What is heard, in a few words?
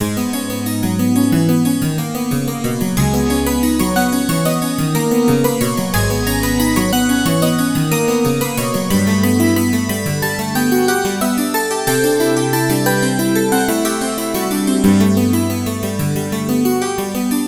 contrapunctus, chiptunes